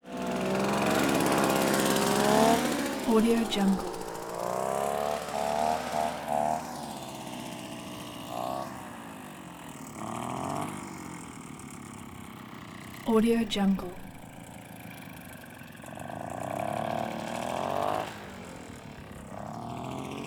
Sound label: scooter moped 2 stroker motorcycle circling